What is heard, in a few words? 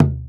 Percussion; Vengeance Samples; VEH2